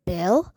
bell; grade1; sounds around us new

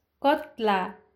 scale; grade5

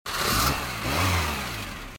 BUS accelerating and smoke; dyslexia

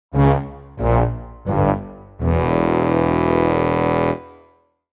lose; grade5; english

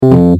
incorrect; grade1; short vowels

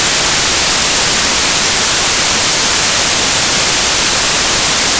tv noise; simple machine

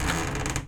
door open; reading diagrams

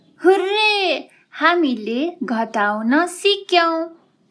yayy; grade1; basicsubtraction

